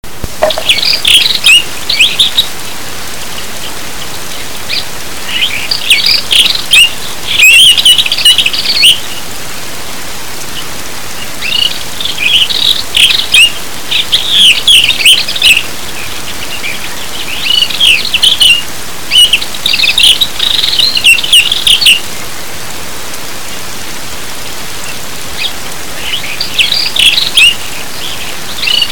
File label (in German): gartengrasmuecke